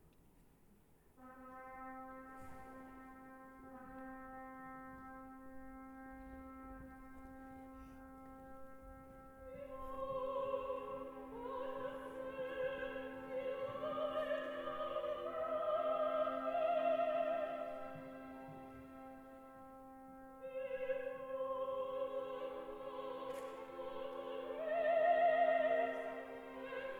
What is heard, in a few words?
Behold, God hath sent Elijah (soprano)